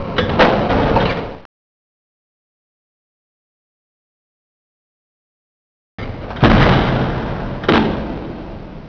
door

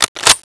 bolt back, weapons; dod

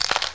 knuckles

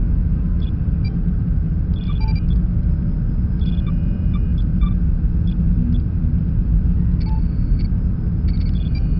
bridge ambience

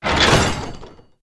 metal door open; svencoop

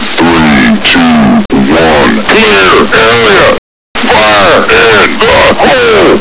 blow; svencoop

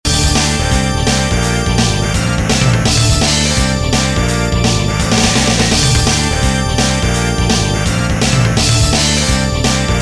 sorrow; svencoop; huntthehaunt

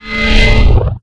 tele in

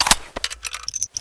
svd mag out; firearms

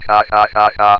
haha; firearms

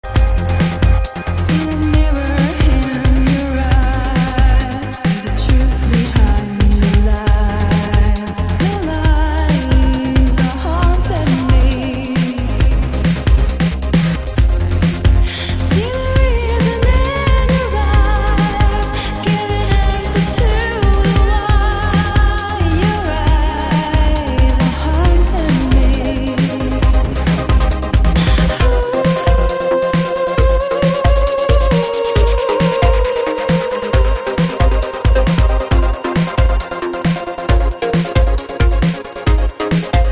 win music

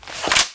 lmg draw; weapons

